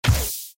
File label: enemies penguin split; Adventure Time - Break The Worm; ThrillGames